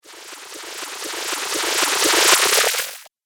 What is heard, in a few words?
Laser Frag Sprinkler, sci-fi laser sound effect; Laser Future - Frag Sprinkler